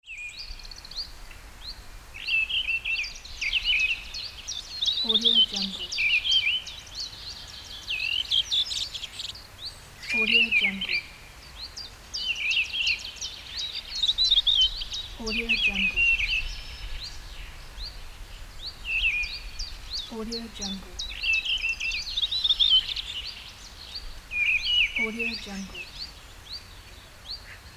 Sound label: birds